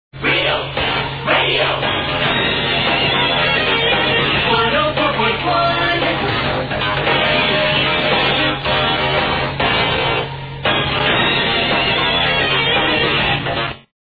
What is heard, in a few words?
sounder; 104.1 Real Radio